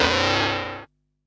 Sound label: loop; X-Static Goldmine 3